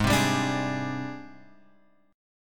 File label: G sharp Major 7th Flat 5th G sharp; G#M7b5 Chord {4 3 5 X 3 4} strummed